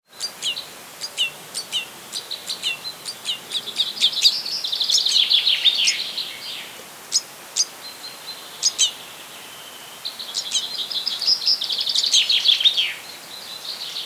Pinar, Trencapinyes; Camí de s'Arxiduc

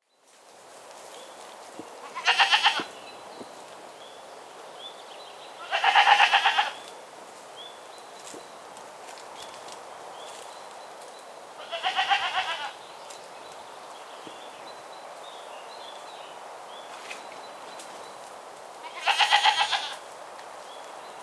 Mamífers Cabres passejant

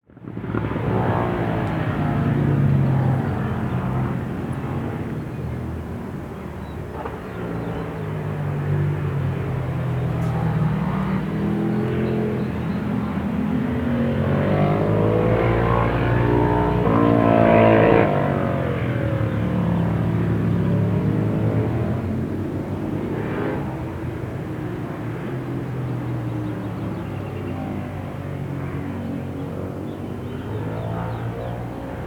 Contaminació acústica, Típica Posta de sol, camí; camí des Correu; Esporles

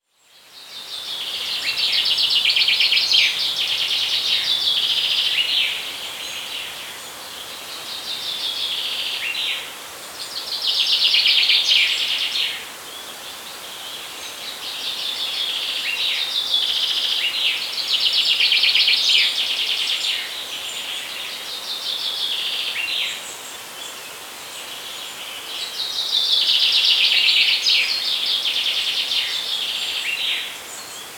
Alzinar; Pla des Pouet

